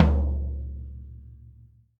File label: Tom Floor Med; hydrogen drum samples